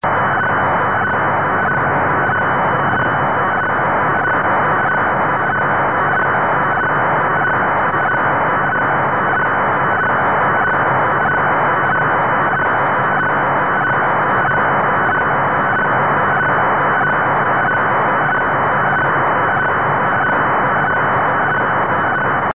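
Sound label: am, satellite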